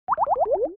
be Hit; ludo

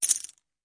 dragon tiger pet chips

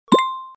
overlap

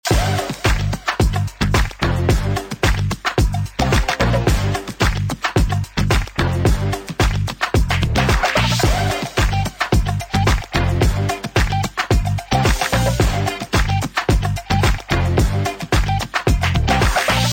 jackpot bgm

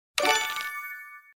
use Control Dice; ludo

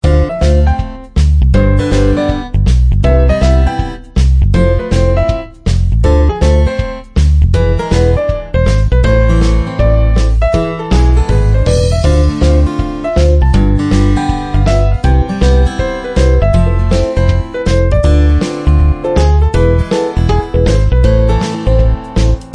roulette background

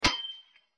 drawing end; greedy

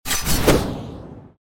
fly To Base; ludo

